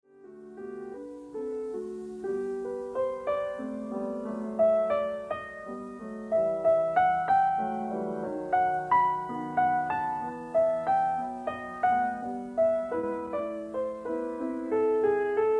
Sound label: Bagatelle for piano in G major